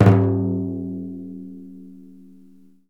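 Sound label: deff finger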